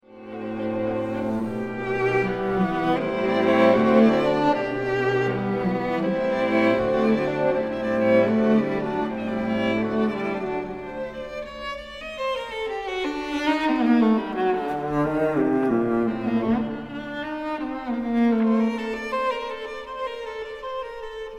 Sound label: String Quartet; Mixing Secrets For The Small Studio